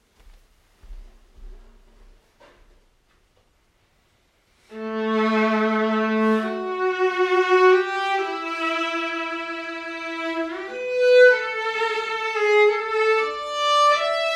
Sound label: viola; Sgarabotto